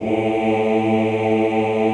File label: OOH A1, Classical Choir; Best Service; Extended Classical Choir; OOH MIX